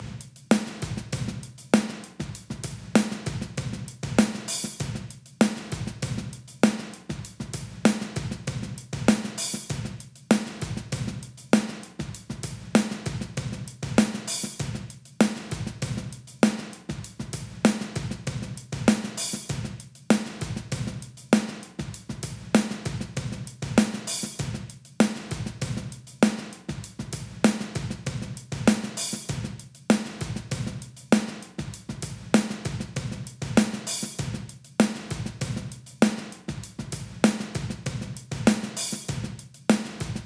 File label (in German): Channel Drums Kompressor Kick n Snare Modus; Flux Evo